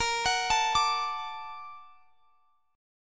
Bb Augmented Major 7th Bb; Bb+M7; arpeggiated; x,x,20,23,22,22

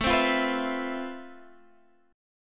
F Major Sharp 11th A

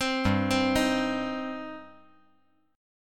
G Suspended 4th Sharp 5th G, down

